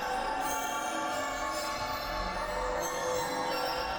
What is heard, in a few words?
Tonal Re Draw, sparse soundscape